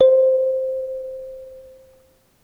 Kalimba Tape One Shot - C; Lofi

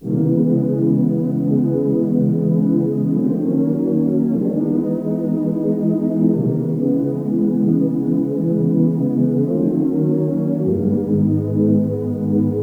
152 BPM B Maj Pad; Loop Stems; Cymatics; 2022 Melody Collection Platinum Expansion - Pt 1; Forever

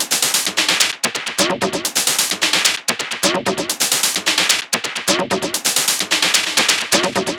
Perc Loop 130, techno; Loops; Percussion; GhostHack; Ghosthack - Free Techno Sounds 2024

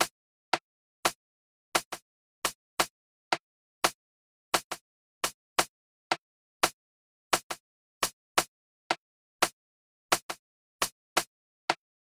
Drum Loop - 86 BPM Accent; Loop Stems; Cymatics; DOPE DRUMS VOL. 1; Bunny Drum Loop

